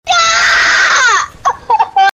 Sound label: screaming rage